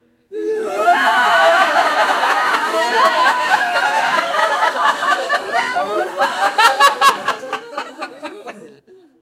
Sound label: sitcom crowd laughing for